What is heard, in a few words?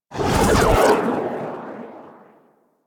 Armillary Sash End Burst; Warframe; Warframes; Nezha